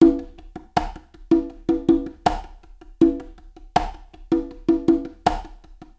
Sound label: conga funk 80, drum loops